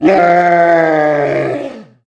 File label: golem death